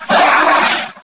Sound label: hit, sword